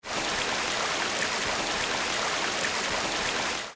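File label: waterfall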